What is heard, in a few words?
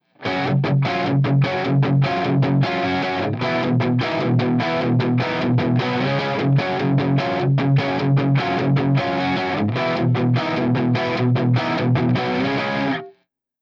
Strat Hot Middle Dirty; BYO Pickups